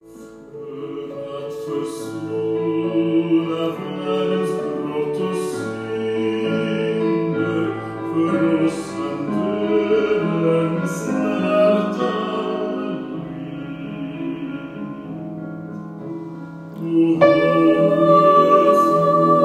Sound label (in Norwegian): o helga natt tenor; åfjord; 52 o hellga natt